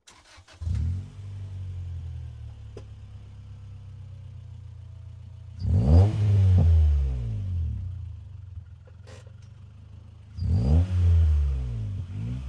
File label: Integra start, multiple revs, idle, fast by & away, Acura Integra